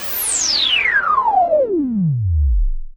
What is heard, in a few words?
Juno-60, Filtered Noise